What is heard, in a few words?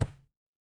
impact bounce